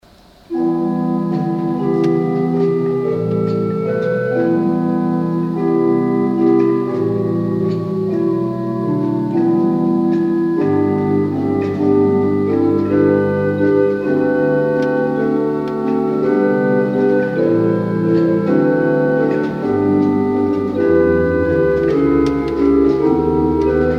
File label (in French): flute; Notre Dame de Lotive